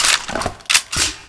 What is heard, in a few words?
par21 reload; weapons; vendetta